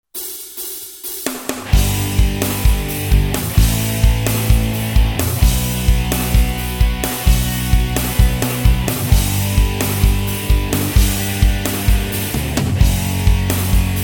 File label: Isolated Solo